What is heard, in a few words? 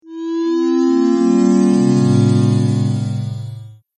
8 Bit Style Magic Transition; Video Game Sound Effects